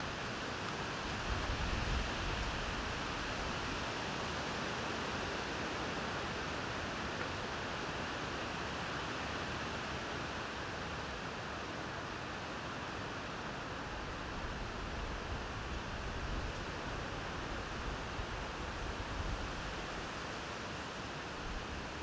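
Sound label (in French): tempete, storm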